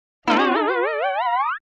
Slide Guitar Comic Stretching Vibrate; Hollywood Edge; sound designer's toolkit v2

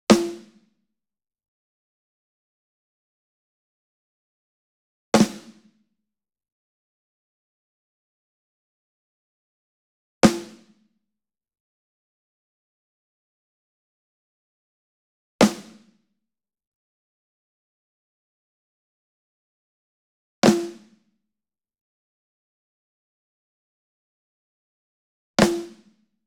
ENSEMBLE SNARE Group Hit Near; boom library; Cinematic Strikes Construction Kit

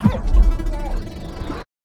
Punch Left Slow Down really hard; Pitch Black - A Detective's Demise

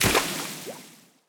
Water Enemy Footstep; AudioWizards

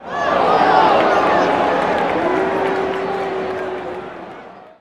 BMX Crowd Boo Small; Crowds

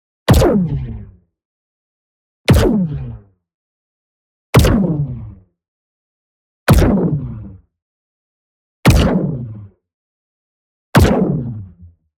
EXPLOSION SMALL Laser Burst; boom library; Matter

